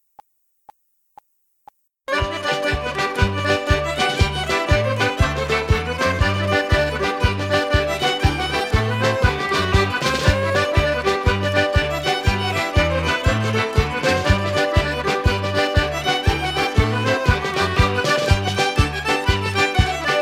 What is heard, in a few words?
Irish Ceili Jigs Medley G, backing track